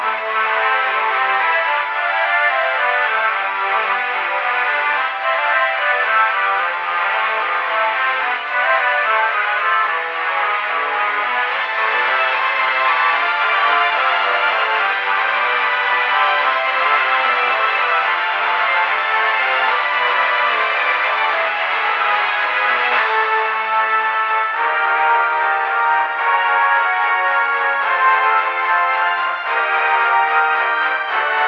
find opponent search music loop

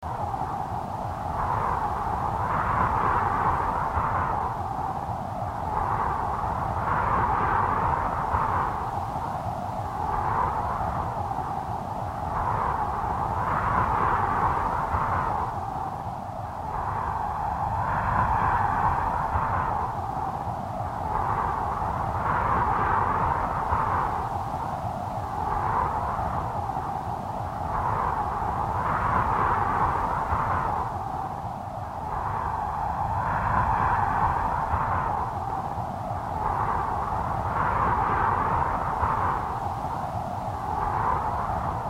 ambient wind; bgm

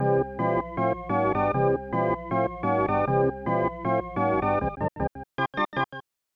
Fanfare ~ Classic; super bases loaded 3; snes

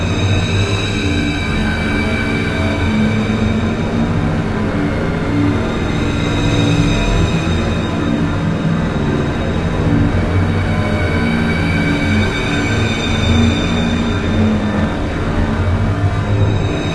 combat ambience (ambience scary); HellSign

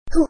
yell; Chibi Knight

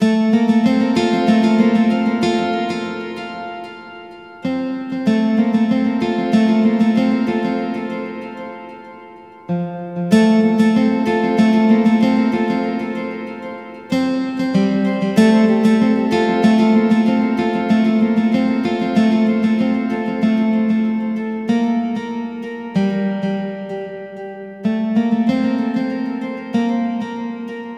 Guitar

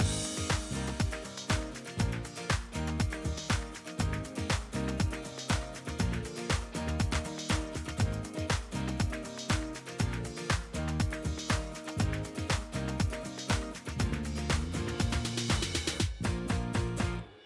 Music loop; My Little Pony World; android

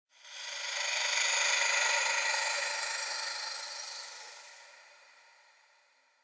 Sting Breath; Dead Rising 2: Off the Record

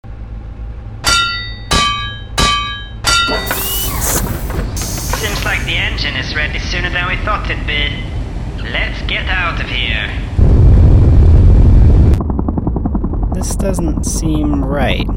Wrench (Engine Room)

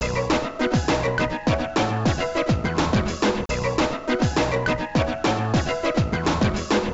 Smash Result; Scud Hammer; arcade

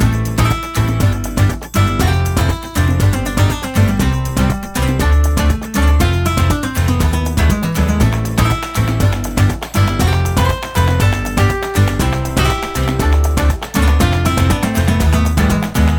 BGM ramen

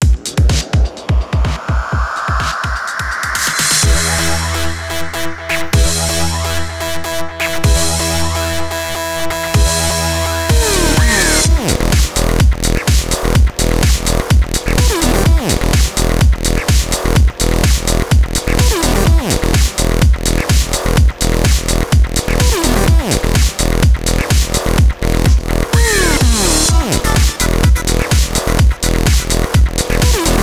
China Vox; street fighter 25th sound box 2013; China Vox 2011 (Round 2)

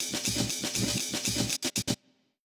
House, Amen fill; Rhythm Doctor; Tutorial

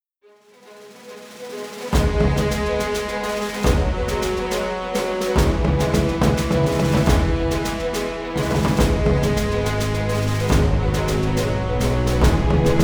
Defeat

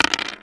Dice

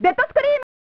Dead Scream; Sailorpluto

